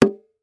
Household Bongo Hit Small Drum Single

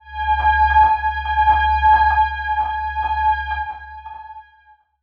Transition Bell Warble; Adobe; Production Element

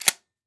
Weapon Gun Shotgun 12 Gauge Pump Release; AdobeCC

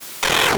Sci-Fi Electronic Radio Shutoff; Science Fiction

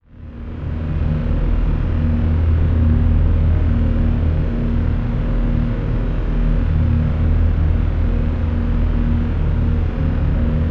Drone Square Wave Distortion Bed Reverb; Production Element Imaging; AdobeCC

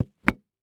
Foley Footstep Work Boots Patio Stone Single Step; Foley Footsteps

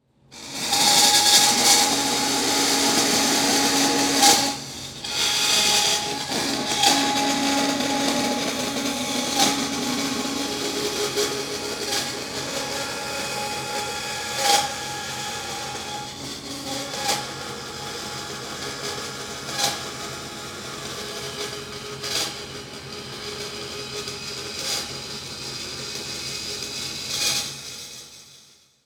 Industry Tool Saw Fan Saw Cut Metal

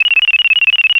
Technology Electronic Cell Phone Ring Tone

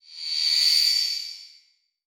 Multimedia Internet CD-Rom Flash Element

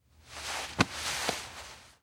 Clothes Sleeve Pull Up Sleeve; Foley

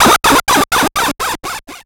Title Transition Delay Short

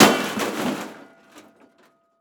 Crash Metal With Ring Short; crashes; AdobeCC

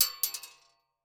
Weapon Bullet Shell 40 Cal Drop On Metal; AdobeCC

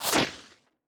Weapon Bullet Ricochet; AdobeCC

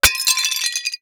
Impact Ceramic Plate Crashes On Cement; Impacts

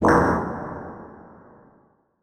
Transition Blast Short Hit; Adobe; Production Element

